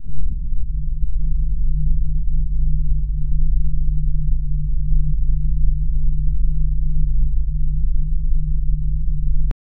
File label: soft glitch textures; aura